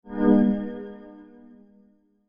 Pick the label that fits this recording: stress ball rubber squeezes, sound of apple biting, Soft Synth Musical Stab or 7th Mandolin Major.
Soft Synth Musical Stab